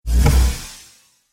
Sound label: Cinematic Hit, Trailer Sound Effects